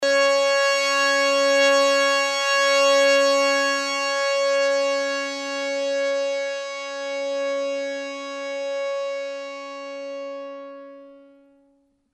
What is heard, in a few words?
String, sitar